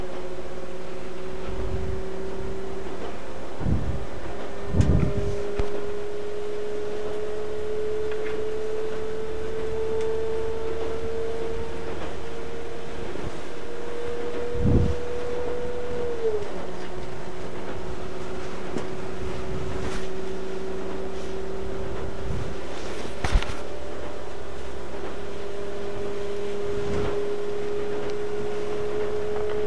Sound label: train arriving at great malvern station